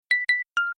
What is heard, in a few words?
Gong